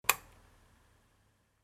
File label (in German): Luefter